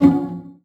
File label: pluck, String; Notes